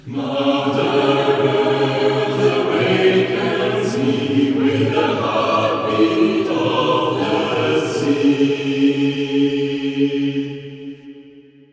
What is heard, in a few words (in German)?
Chor; Chor4More